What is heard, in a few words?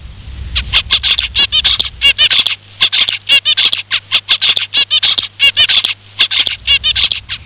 ZEBRAS